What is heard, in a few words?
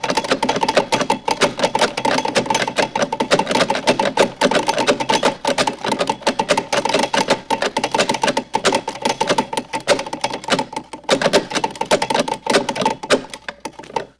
Metal Toy Gyroscope; Vintage Cartoon; Foley